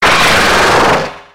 Grito de Zoroark

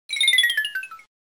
Grito; Kricketot

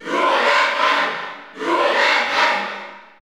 Grito del público, Español; Duck Hunt; SSBU

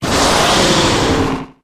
Grito de Arctovish

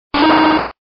Grito

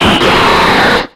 Grito de Sharpedo

